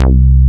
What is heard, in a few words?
JNO SPIK C3, SPIKE BS